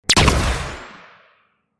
fire, weapons; light rifle

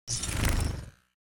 out, sword; bane

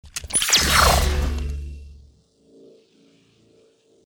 draw

